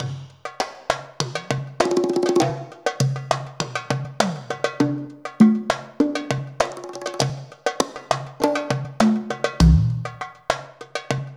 Musical accent tribal hand drums darbouk groove; SFXBible

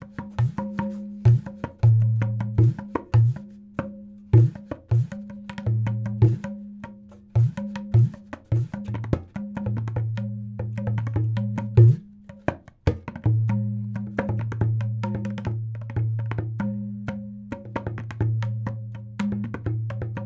Musical element tabla beat drum; BLASTWAVEFX

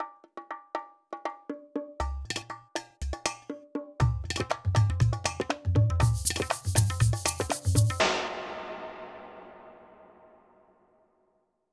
Musical accent tribal rhythm drum hit; SFXBible

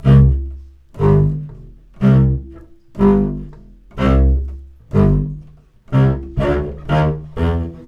Double Bass Bow BPM 123

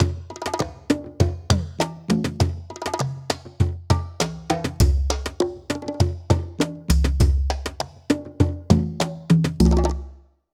Musical accent tribal hand drums kahon groove; SFXBible

